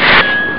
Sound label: cash